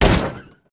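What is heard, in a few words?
metal large, Impact; Cars; XBOX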